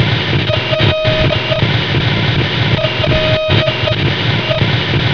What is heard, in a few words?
static; CHASM